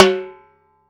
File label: timbal; CUBA